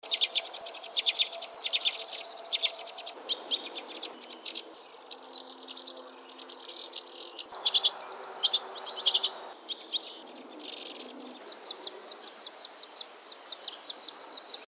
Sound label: CROSSBILL WHITE WINGED